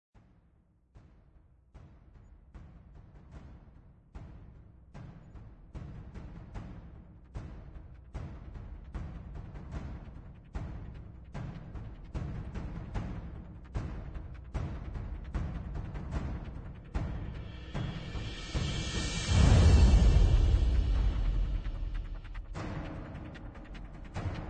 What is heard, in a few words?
Percussion Only